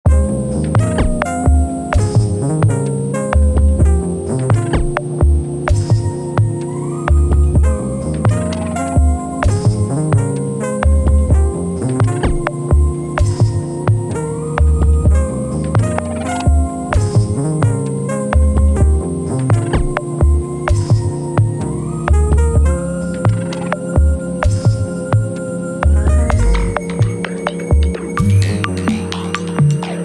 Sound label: processing wait music